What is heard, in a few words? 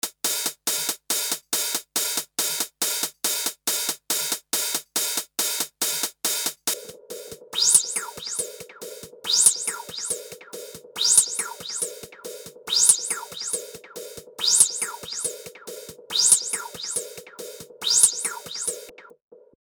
Hi Hat Flip